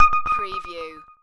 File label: Amb ping